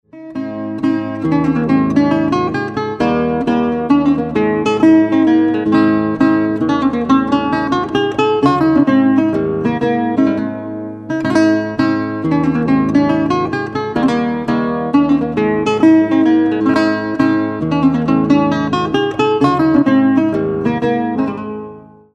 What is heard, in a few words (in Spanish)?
MINUET Y DIFERENCIA